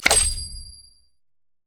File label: pick a card; LoL; twistedfate